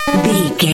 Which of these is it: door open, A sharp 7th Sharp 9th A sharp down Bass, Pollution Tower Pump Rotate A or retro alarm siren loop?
retro alarm siren loop